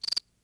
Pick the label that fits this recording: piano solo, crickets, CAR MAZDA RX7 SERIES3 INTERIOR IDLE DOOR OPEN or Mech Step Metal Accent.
crickets